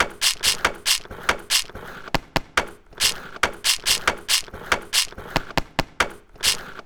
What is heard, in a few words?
Organic Percussion Loop 140 BPM